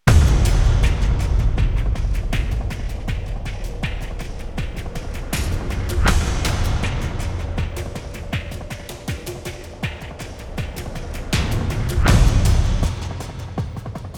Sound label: 80bpm; Tomania; EARMOTION